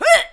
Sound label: jump; Alien Arena; cybergirl